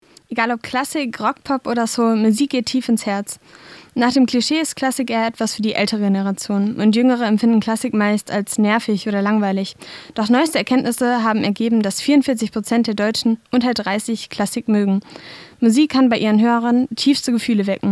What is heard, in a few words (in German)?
Klassische Musik; KultMedien